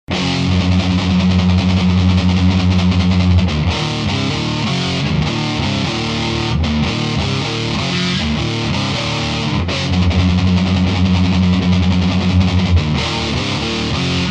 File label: badcat double gain